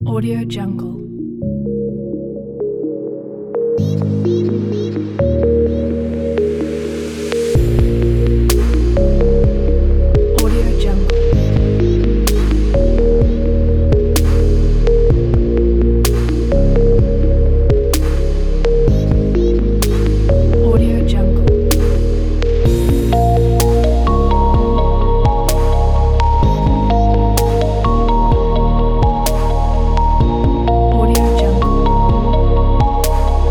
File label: digital technology